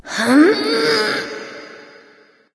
grunt lift light; smite; voicepack; hel

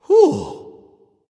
sigh; Smite; bacchus

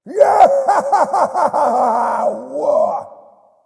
yay; Smite; cabrakan

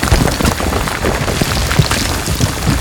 lurking; Smite; Sobek

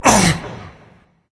ouch berserk; cuchulainn

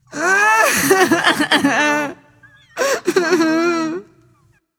boohoo; smite; mulan

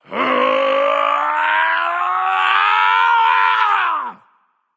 battlecry; smite; voicepack; xbalanque

